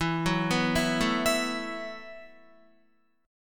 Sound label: E Suspended 2nd Suspended 4th E, Guitar, 4F,4A,5C,5G,5C,6E; arpeggiated; 11,9,11,9,9,12; JGuitar